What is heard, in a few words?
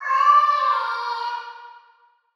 FX - Vocal; Hip Hop; Cymatics - 9God Hip Hop Sample Pack